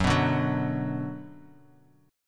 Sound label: Gb Suspended 4th Sharp 5th, chord; strummed; 14,14,12,11; Bass Standard